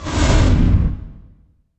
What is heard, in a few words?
IMP FLAME, Cinematic Impact